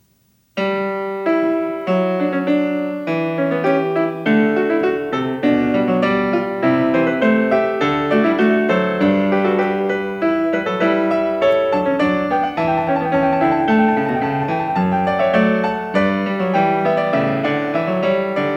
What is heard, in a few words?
Alla breve a 1 Clav.; Variation 22; Bach; The Goldberg Variations